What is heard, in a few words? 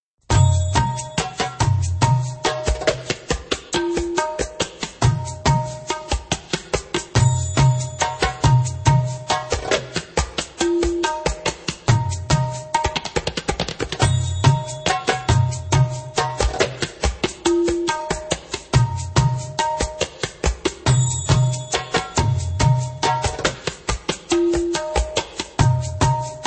Indian Saidi (slow)